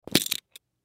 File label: Belt Drop Carpet; Drops; Foley; Drop Sound Effects; Objects; Blastwave